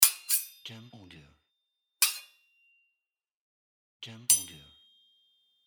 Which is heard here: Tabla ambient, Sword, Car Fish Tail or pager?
Sword